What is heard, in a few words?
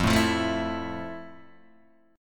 F Major 7th F, Guitar, DADGAD